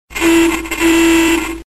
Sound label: Car Horn